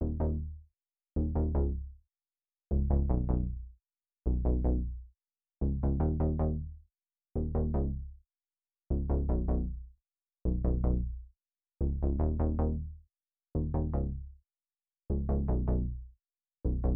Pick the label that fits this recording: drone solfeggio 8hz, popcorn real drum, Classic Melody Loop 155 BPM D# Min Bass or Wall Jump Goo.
Classic Melody Loop 155 BPM D# Min Bass